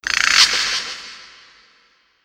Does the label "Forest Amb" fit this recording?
Yes